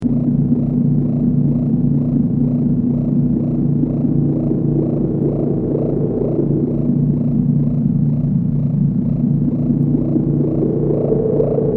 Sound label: Machinery W Reverb; analog synth